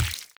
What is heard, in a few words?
wand eat orange walking thing